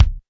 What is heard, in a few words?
BD DRY; R-8mkII; Bass Drum; RHYTHM WORKS feat R-8mkII